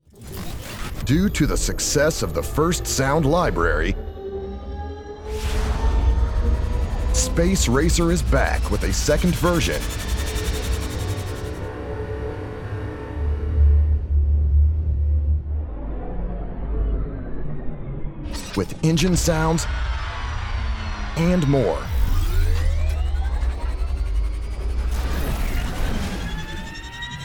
Space Racer Turbines And Engines; Fox Audio Post Production; Space Racer 2

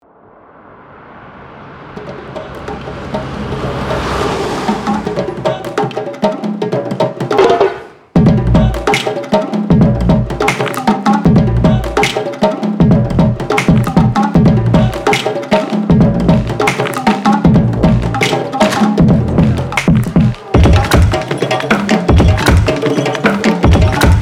dakar street percussion; Splice Sounds Sessions

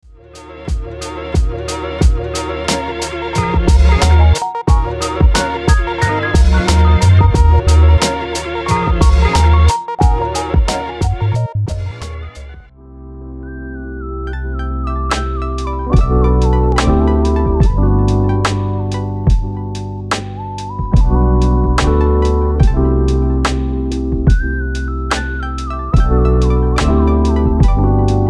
astrosoul lofi and jazzhop; laniakea sounds

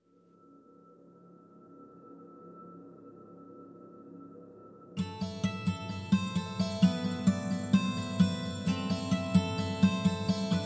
guitars; dream audio tools; kontakt

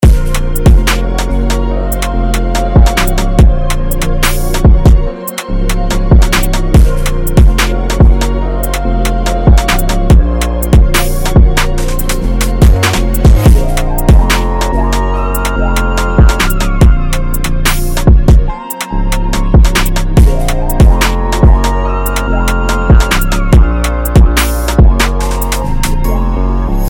Drill; Jay Cactus; Drum Saga; The Ultimate Drum Kit Bundle

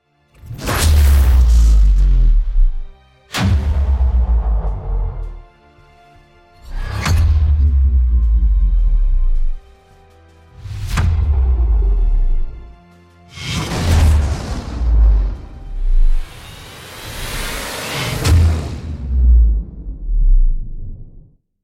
Sub Sonic Bass; Fox Audio Post Production; Mini Library

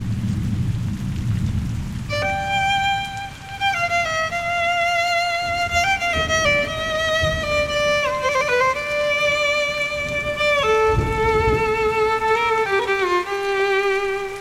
Azerbaijani Violin Naked; Earthtone

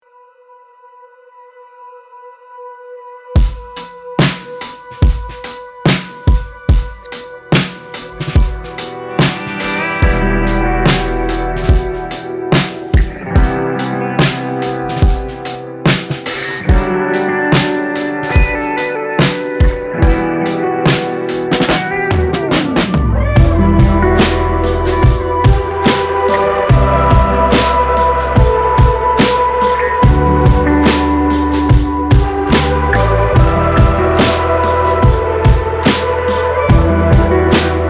Country Dawn Golden Minimal; folk; Splice

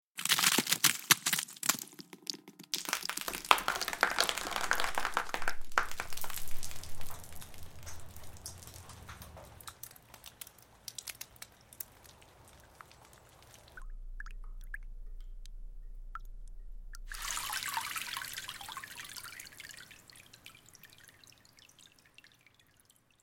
big room sound water drips

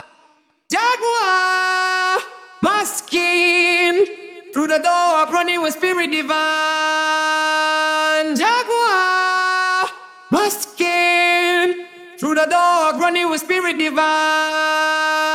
Loopsy, afro house; production suite

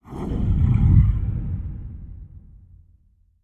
Monster; sound ideas; monsters sound effects

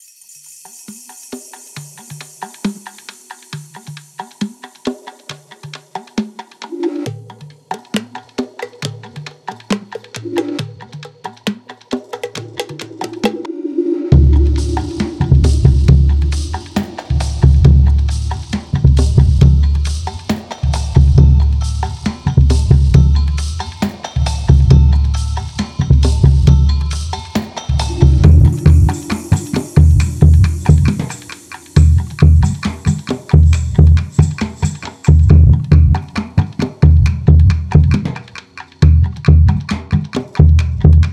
Percussion; rare percussion; percussion science volume 2

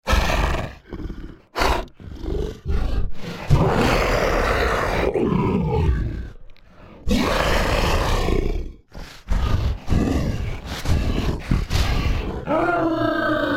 Creature; Big Room Sound; Abominog

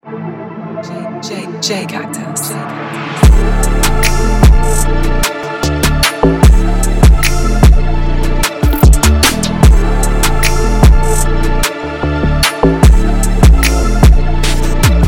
Drum; jay cactus; drum saga the ultimate drum kit bundle

